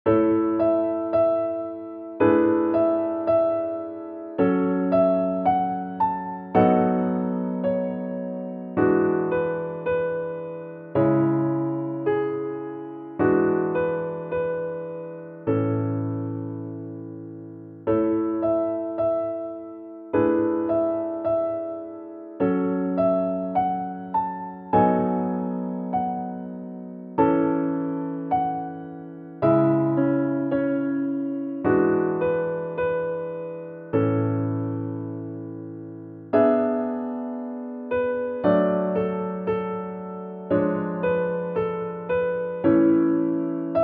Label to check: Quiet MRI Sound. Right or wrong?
Wrong